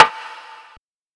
Snare

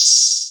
Open Hat

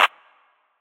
Clp, Claps

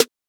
Open Snares

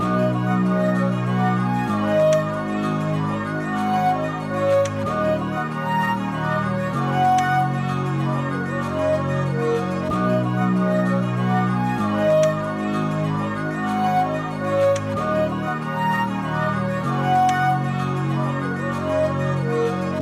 BPM 95

